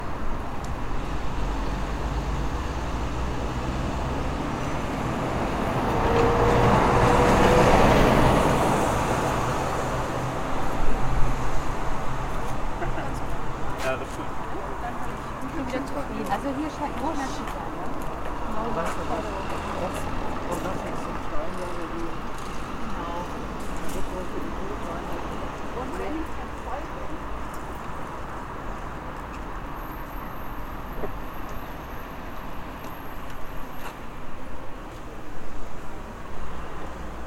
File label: City traffi